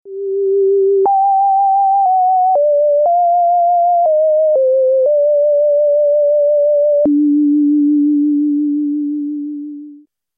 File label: martenot